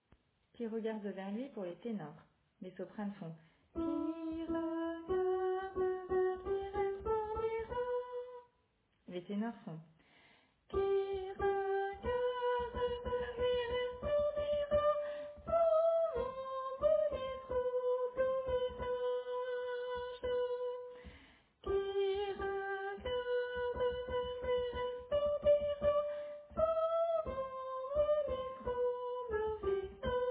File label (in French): Tenor; St-Jean-Baptiste-de-la-Ste-Famille